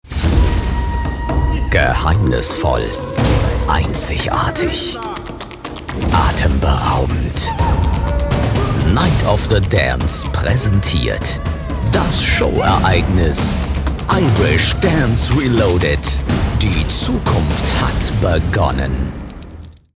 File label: irish dance audio